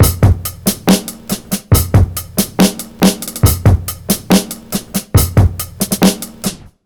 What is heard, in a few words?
Oldschool, сэмпл ударных dubstep old school